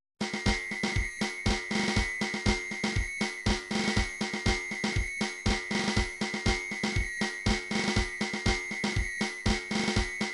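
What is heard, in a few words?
drums pattern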